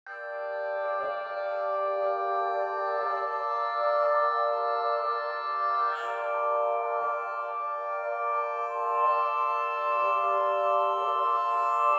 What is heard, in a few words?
ambient